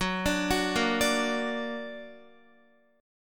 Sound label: Gb Augmented Guitar; x,9,12,11,10,9; Gb+ arpeggiated